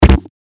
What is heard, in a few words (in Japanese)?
動かせないブロック; モノラル